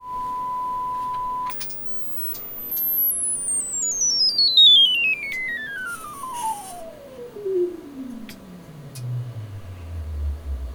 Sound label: Mastersound 845 Singing; Mastersound 845 compact integrated amplifier measurements